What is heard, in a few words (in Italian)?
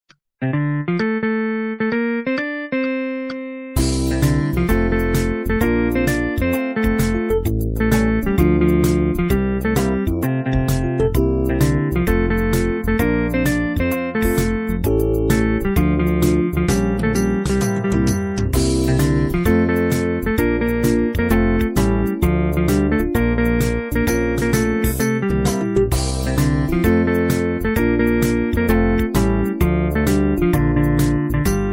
solo musica